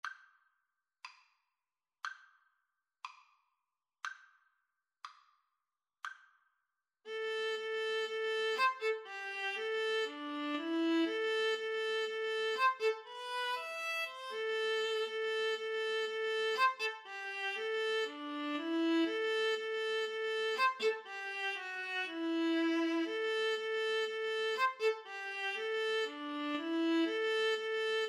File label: Viola Cello Duet